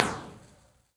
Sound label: Arch; Dipway; Impulse Response; EchoThief Impulse Response Library